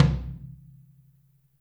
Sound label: FLR TOM OH; Q Up Arts; Denny Jaeger Private Collection